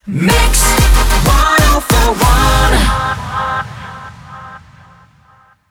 125bpm Ab Rapid Fire; WBMX 2017

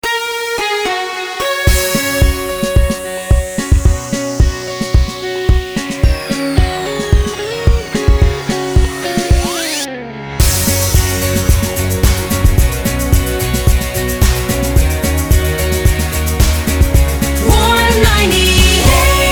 Medium 110bpm Ramp, jingle; Warm 98

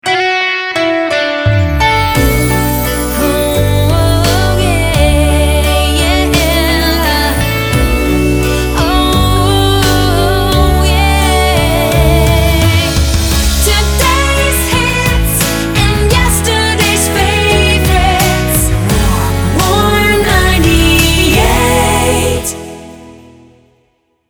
Slow 086bpm Main ID, jingle; Warm 98

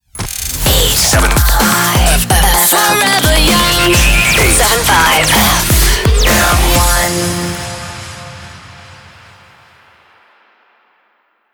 Jingle 128bpm Fm Main ID; 87.5 FM1 Manila

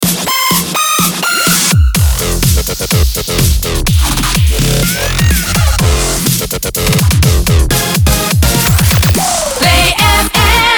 125bpm Fmin MIX Ramp

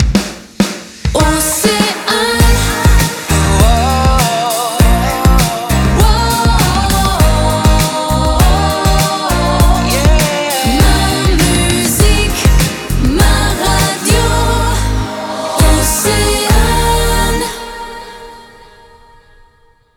Jingle; Océane 2022

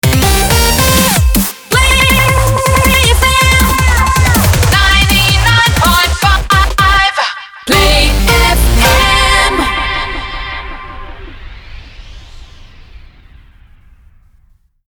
160bpm Cmin Main ID

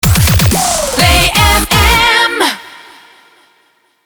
125bpm Fmin MIX Rapid Fire

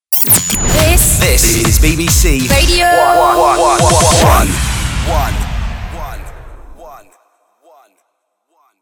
Station ID, jingle; BBC Radio 1

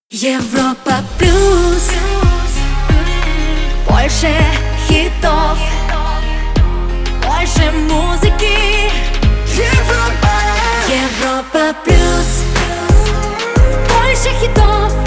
Jingle; Europa Plus

